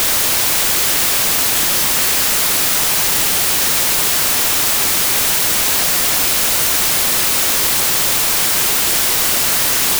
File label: white noise